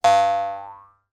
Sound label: cartoon spring bounce twang